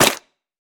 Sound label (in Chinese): 沾泥的红树根踩踏声